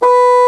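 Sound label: Bassoon B0; Digital Sound Factory Virtuoso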